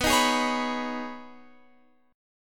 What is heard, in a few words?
B 6th Add 9th B down Guitar Open F; B6add9; strummed